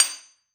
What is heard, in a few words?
Industry Tool Hammer Metal Hammer Single Hit On Cement